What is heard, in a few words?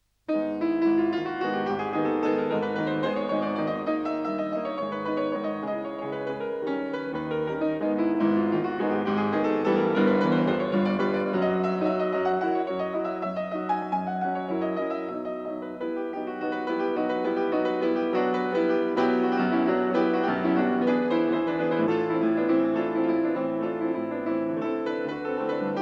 Prelude in G sharp minor, piano